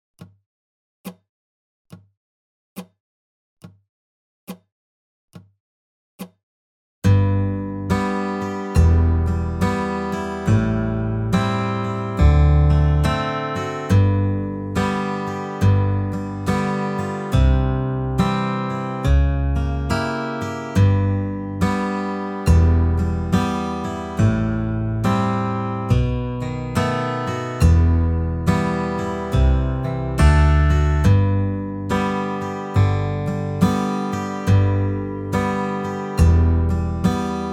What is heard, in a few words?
fancy chords - 35 BPM; Auld Lang Syne; Holiday 2025 Dobro Zoom Workshops